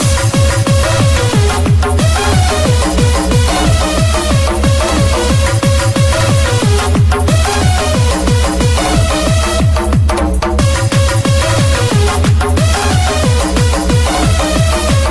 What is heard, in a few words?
unknown song beat